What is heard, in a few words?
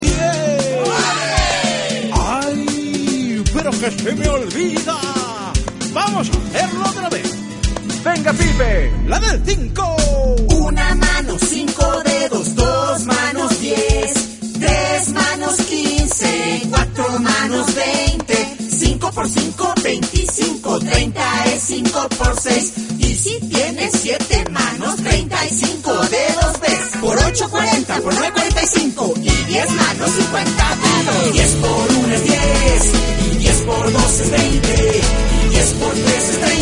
pipe